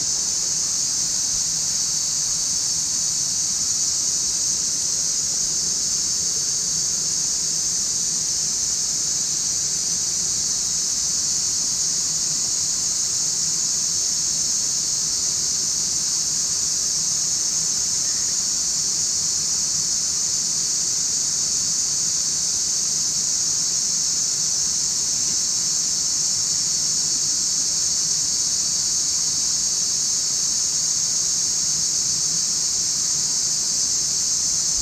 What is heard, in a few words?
cicadas; Caoling